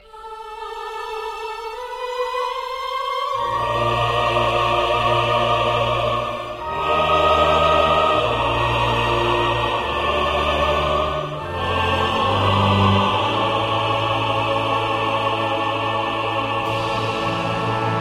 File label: Choir